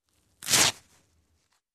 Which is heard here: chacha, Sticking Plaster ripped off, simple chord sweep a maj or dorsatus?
Sticking Plaster ripped off